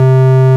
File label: ORG, Farfisa Clarinet